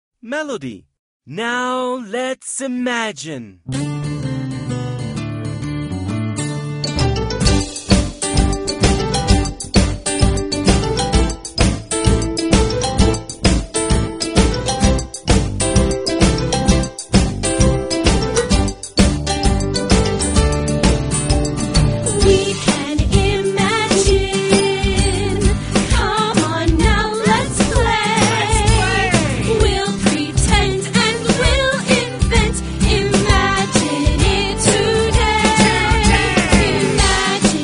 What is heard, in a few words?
melody